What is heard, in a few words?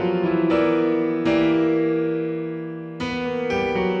Piano 120-E, horrorscape samples